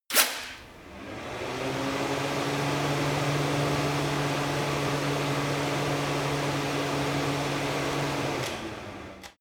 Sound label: wall fan full sequence